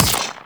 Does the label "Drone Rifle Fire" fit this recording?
Yes